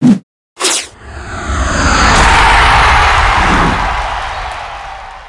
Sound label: Sfx American football